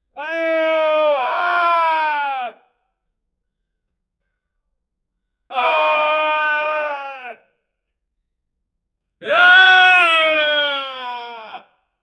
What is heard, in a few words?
man crying and shouting; human emotions